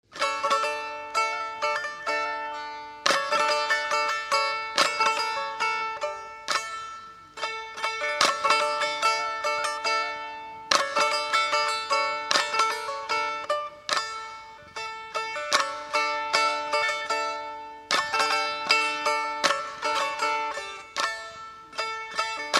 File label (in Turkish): zeybek oyun havasi, ege; muzik kulturu